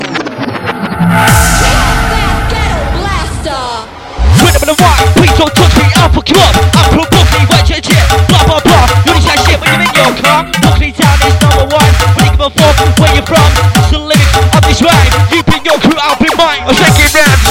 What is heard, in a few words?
Atmosphere Sick Donk